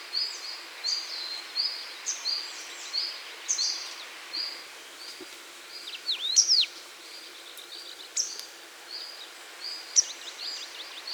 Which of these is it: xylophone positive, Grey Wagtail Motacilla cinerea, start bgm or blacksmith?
Grey Wagtail Motacilla cinerea